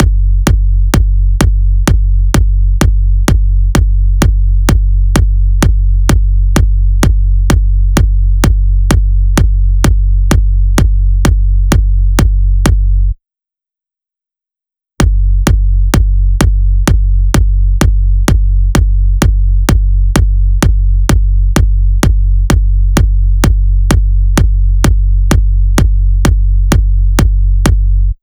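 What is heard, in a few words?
bass drum f#, Drum Loop 128BPM; VEC5 Drumloops; VEC5 Multi Loops 128BPM; Loop Elements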